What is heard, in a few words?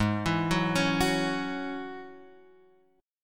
Ab 7th Suspended 4th Sharp 5th Ab Guitar Open F; Ab7sus4#5; x,3,4,1,4,1; arpeggiated; chordshape; JGuitar